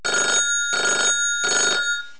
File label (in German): telefon